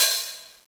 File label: "ohh"; Angelic Layer